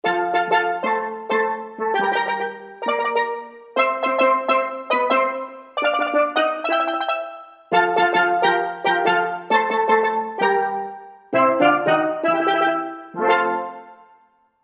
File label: Roland JV-2080 Steel Drums